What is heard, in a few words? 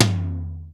DRY T, drum; Crypton; DrumBase5000